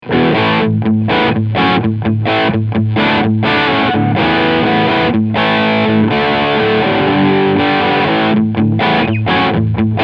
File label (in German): Crunchsound Steg PU